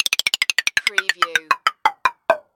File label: CARTOON PERCUSSIVE WIND DOWN